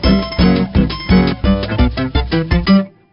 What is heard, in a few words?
Jingle Game Start; tamagotchi connection corner shop 2 ds